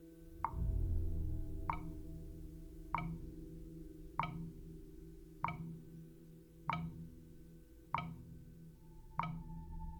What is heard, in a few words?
tick sample